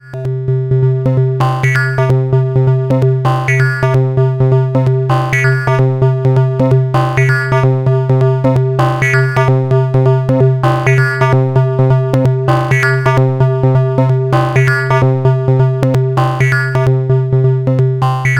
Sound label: Trident ModOSC1 FM moduliert; Rossum Electro-Music; Zing